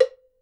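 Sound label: WOOD BLOCK; Miroslav Vitous - Percussion & Harp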